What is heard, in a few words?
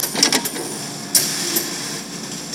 COLLATOR, INDUSTRIAL FX; Greytsounds